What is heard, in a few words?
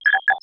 BLEEP; PC Gamer IT CD 32; XCOM; Gadgets